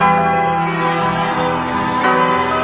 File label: violin piano tangobrk; STRINGS ORCHESTRAL CLASSICAL LOOPS